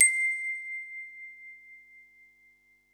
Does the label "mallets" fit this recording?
Yes